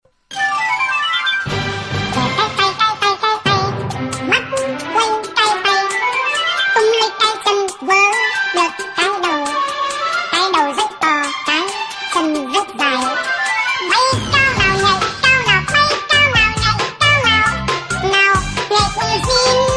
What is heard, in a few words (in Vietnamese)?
nhạc quảng cáo